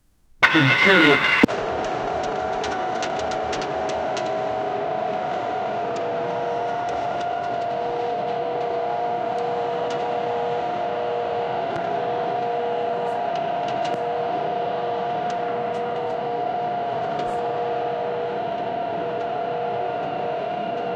engines buzzing and revvi

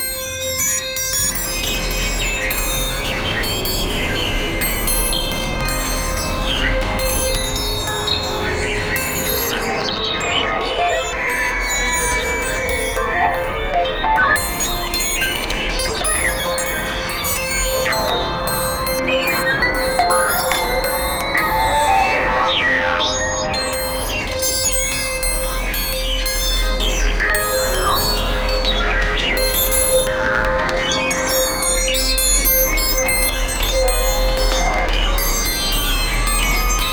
futuristic cyberspace sci, cyberpunk

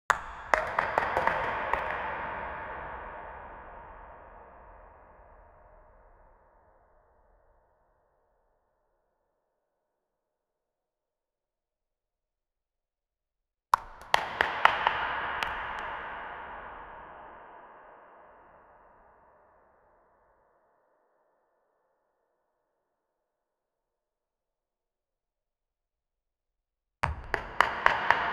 slow measured high heel c, cave

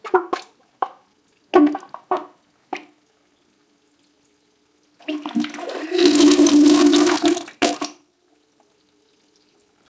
make a sound teen girl, toilet

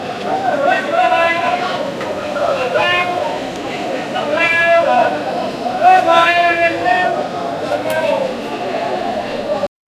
a bustling medieval marke; marketplace

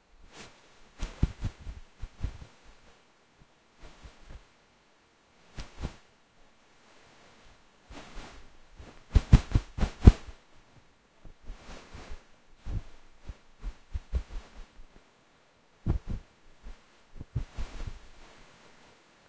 the sound of clothes flap, cloth

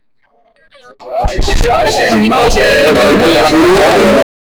sounds of a alien night, alien voices

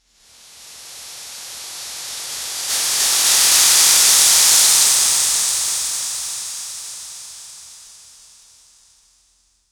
the sound of blossoming a, flora

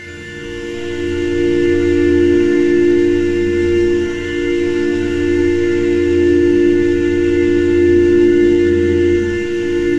dense atmospheric drone

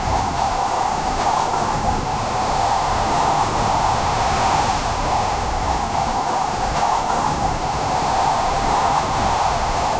flying car wind sound

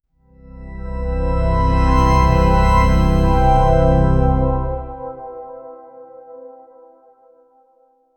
a clean professional audi, corporate

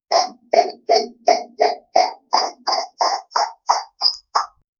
getting faster; motion

